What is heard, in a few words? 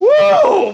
"woo", male